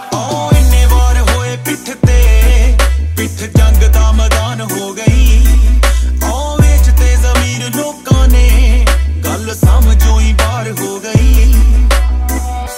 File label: Punjabi Ringtone